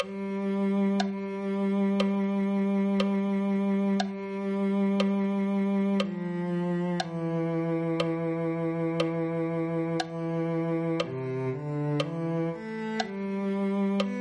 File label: cello